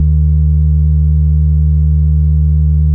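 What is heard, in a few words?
Yamaha CS80 D# 2; ESOTERICANLG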